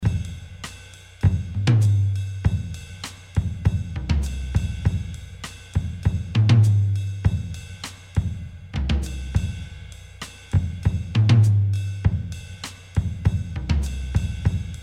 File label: 60s drums big bottom